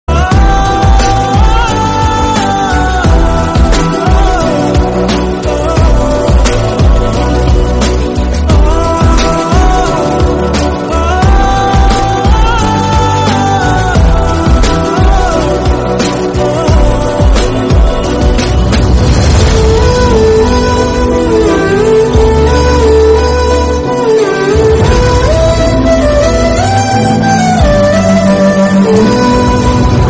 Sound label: Instrumental Ringtone